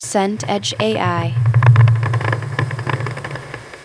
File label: suitcase roll